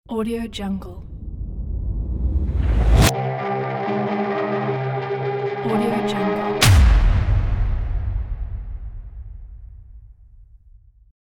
cinematic teaser logo

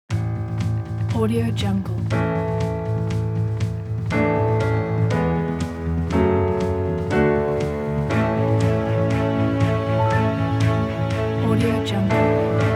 positive political ident

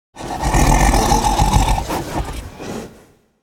monster dragon growl snarl

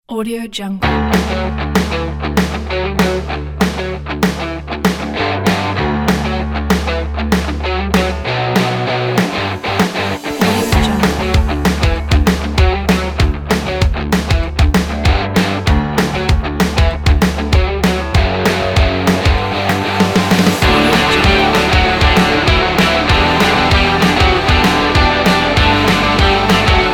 inspiring upbeat rock

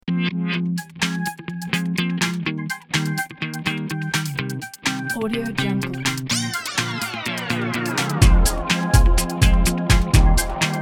atmospheric chill guitar electronica

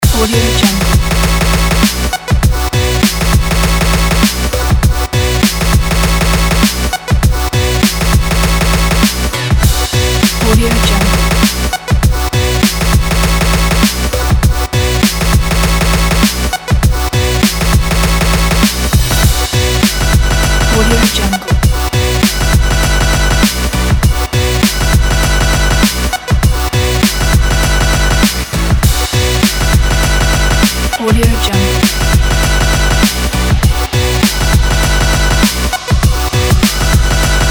sport hiphop pack

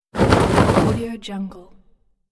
clothes rustling

